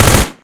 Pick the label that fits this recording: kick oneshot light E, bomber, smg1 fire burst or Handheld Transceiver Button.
smg1 fire burst